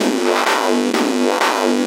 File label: VFX2 Loop 128 BPM